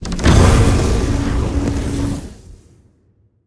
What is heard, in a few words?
fire activate; smite; god merlin